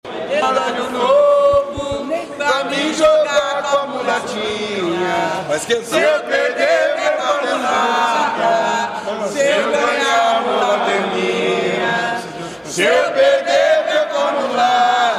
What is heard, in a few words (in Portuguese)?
batuque de umbigada piracicaba baralho